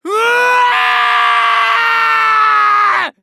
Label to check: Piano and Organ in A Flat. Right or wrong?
Wrong